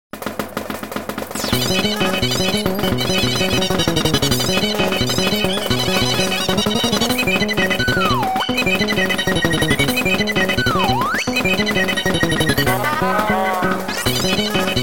fight music; dota

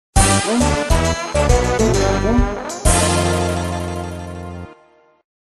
draw music

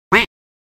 quack

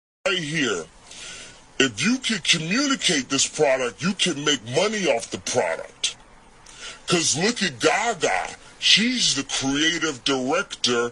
polaroid

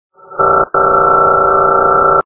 fail; vapesquared

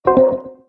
disconnect

